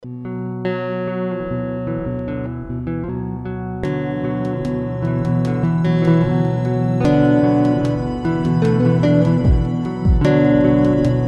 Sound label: instrumental